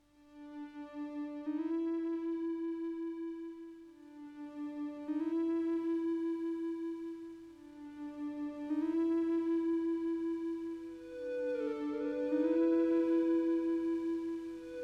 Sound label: Loop Only; Wind On Water; Paris 1975